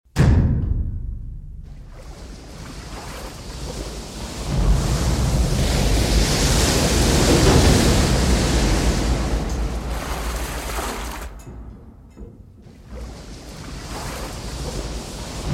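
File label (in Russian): люки с водой; Звуки подводной лодки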